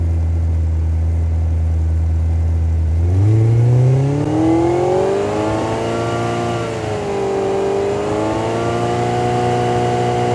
Ferrari 355 Fast Idle; Automobiles

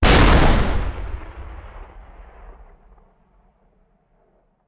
Cannon Tank; Sound Ideas; Guns

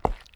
boots wet hard; Apotheorasis

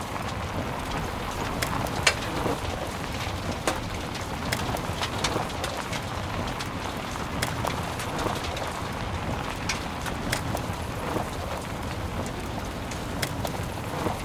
drive surface gravel loop; Blind Drive

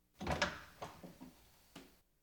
Household Phones

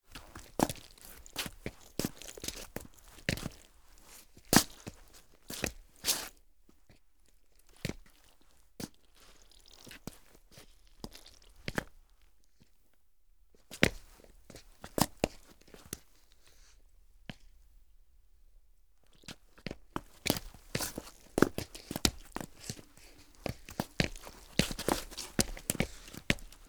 Footsteps Multiple Ice; Impacts; 1001 Sound Effects

